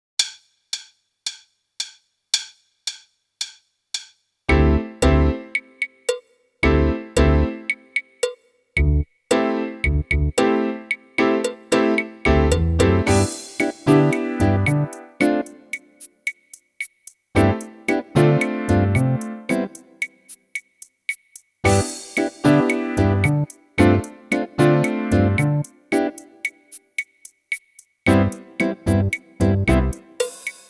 Drum Solo; Meespeel CD

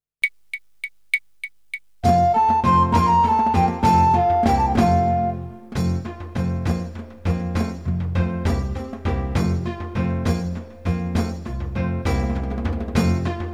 Jig

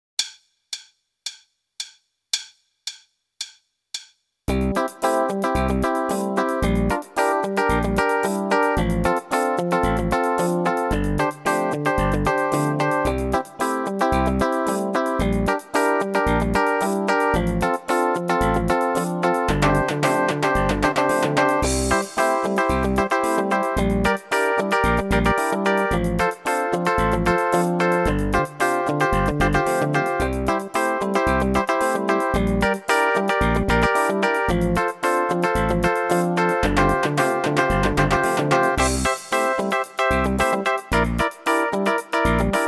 Tom Tom Beat; Meespeel CD

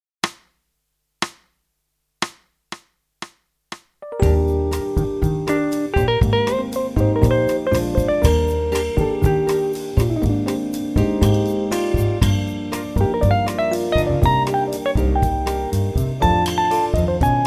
Bossa Nova

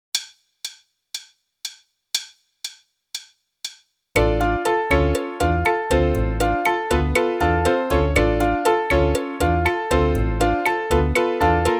Rim Click; Meespeel CD

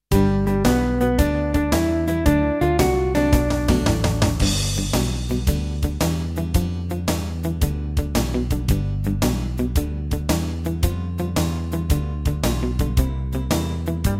Boogie Woogie Bass; Meespeel CD

